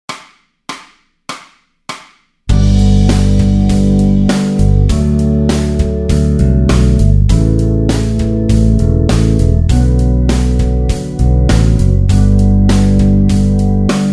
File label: backing